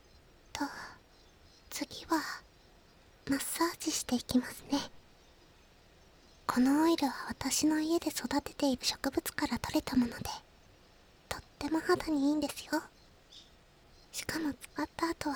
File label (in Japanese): 【右】オイルマッサージ, ASMR; エルフメスガキ; 森の癒し耳ほじり